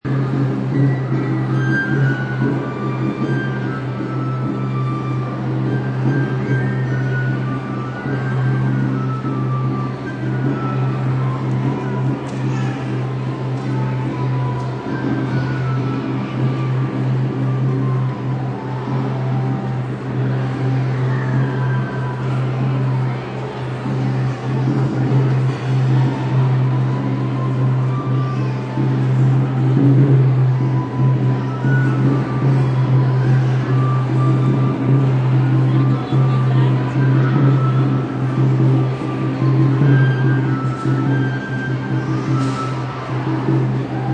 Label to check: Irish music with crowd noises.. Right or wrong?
Right